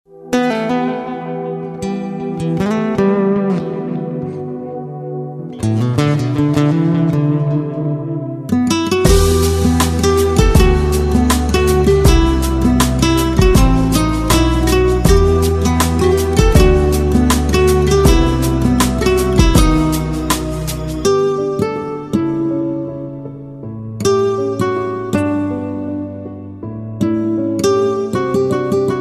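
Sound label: Heart Touching Guitar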